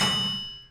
brake fix

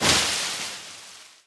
Tree Leaves Hit; Folk Hero

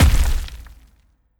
Earth Impact; Earth Quag Impact1

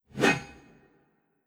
Metal; Engineer

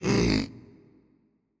Quetz Stars VO; legend of keepers gamerip 2019

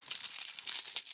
Coins

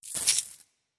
Upgrade

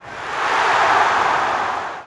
Music

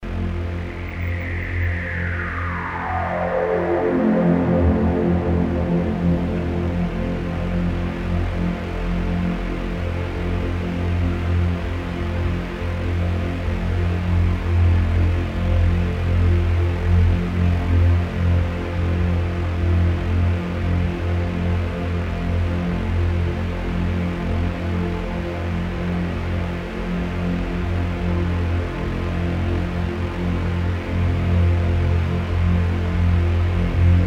Robot factory music

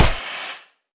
Nature Hit

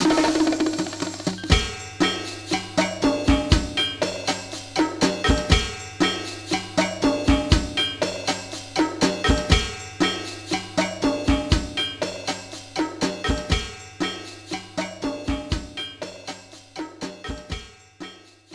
Loading; Vib-Ripple; PS2

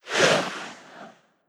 Ghost Run

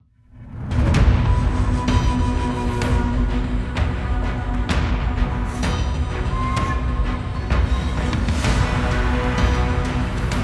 Battle

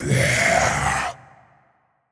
Hit Summon monster

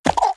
Enemy Poult Walk

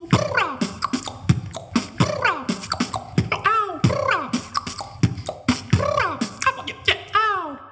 beatbox melody; 16beats

